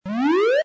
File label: gamble win; games; poker; American Poker The Legends II